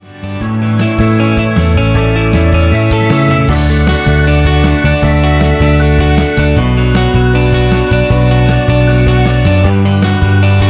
Rhythm; MIUI14 and HyperOS 1.0

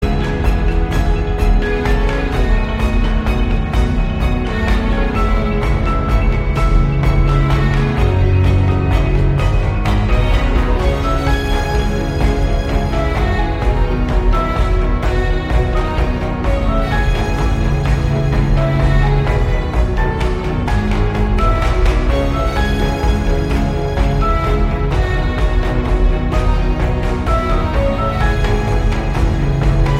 Orchestration; MIUI12

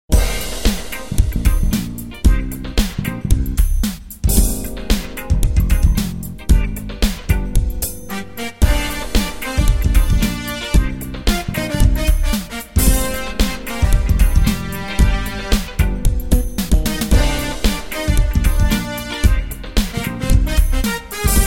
Crazy Funk; MIUI12

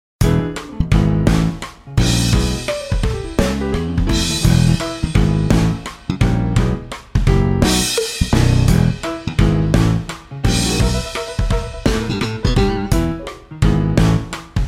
Hello Rock; MIUI12

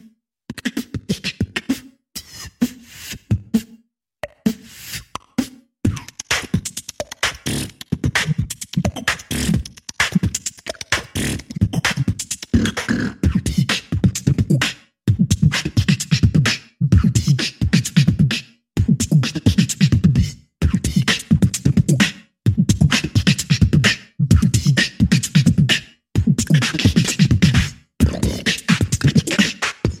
B-BOX rhythm; MIUI12

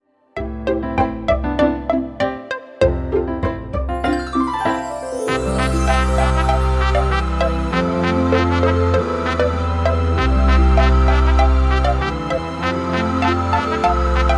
Virtual Electronics; MIUI12

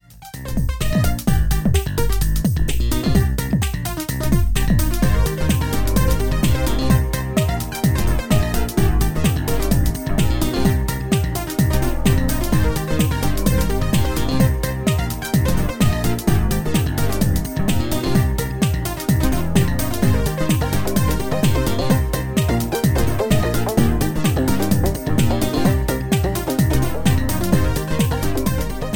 Electronic Easygoing Game; MIUI12

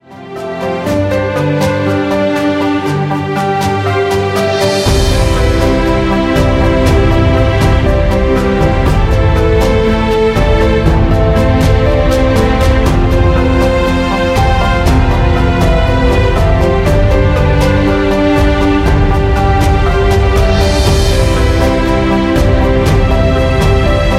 Inspirational Piano; MIUI12

